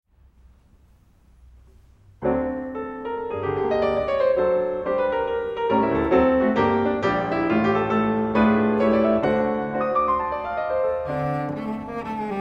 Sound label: Sonata for Cello and Piano in G Minor Op65 IV Finale Allegro LIVE; Chopin